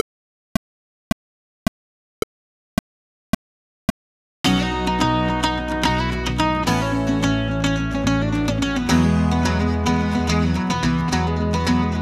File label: Backporch Cajon track only cajon track